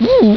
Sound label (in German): panzer; robossy